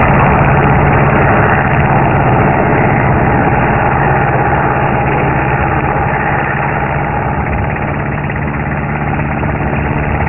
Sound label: Helicopter Circling Back; Mike Koenig